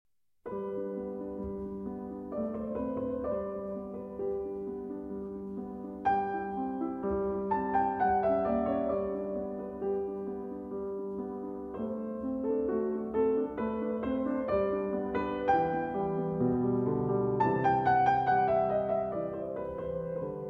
Piano Sonata in C Major, 2 Pianos; No. 16; K. 545 For Beginners, EG 113c; Arr. For 2 Pianos By Edvard Grieg

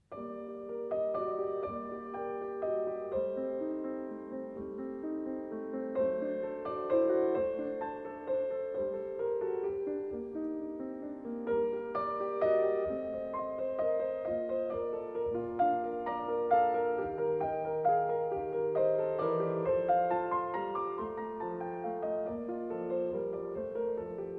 Flute Sonata in E-Flat Major, Siciliano

